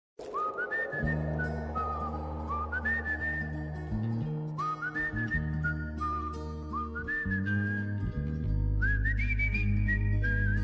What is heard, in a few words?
whistle bgm; manmadhudu